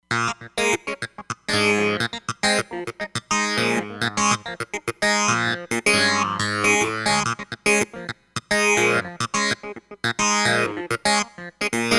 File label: Phase Clavi, Roland Fantom XR